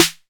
shiny snare drum e key

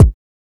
wet kick A key; Mono

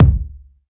puffy steel kick drum sample c sharp key

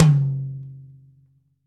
high floor tome drum sample c sharp key

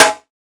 good acoustic snare sample g sharp key

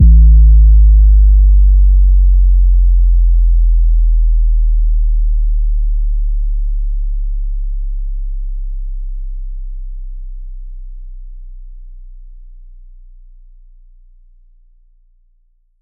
phonk kicks oneshot 808 A; kick drum samples

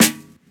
sparkle steel snare drum a sharp key

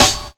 clean acoustic snare c key

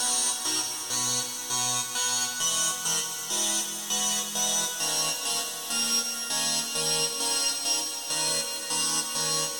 lazy shimmer texture 100 bpm, synth loops; instruments

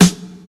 steel snare drum f key; snare drum samples

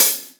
studio open high hat a key

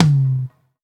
reggae tom single hit c key

